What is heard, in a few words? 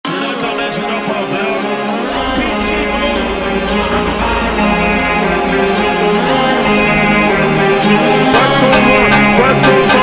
Funk slowed